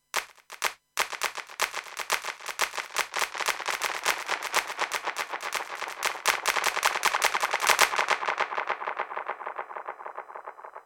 ROLAND SPD ONE ELECTRO Standard Clap Delay

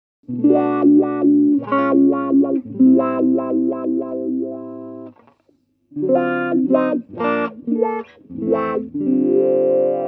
chords

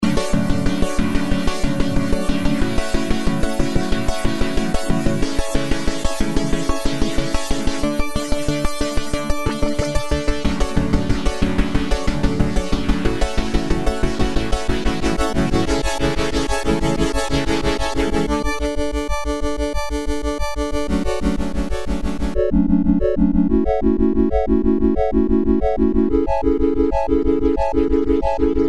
chord sequence tweaking

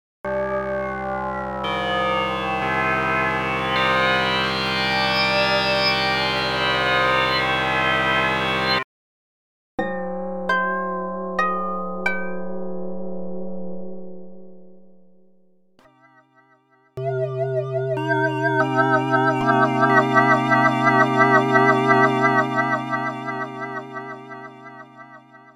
Band Resonator

